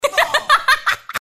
rir ate chorar

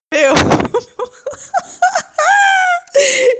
rindo

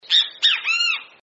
bem ti vi

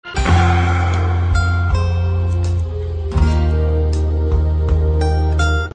musica triste; The cat music

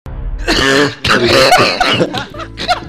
risada

